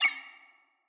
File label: graphics high short glitch; soundscrate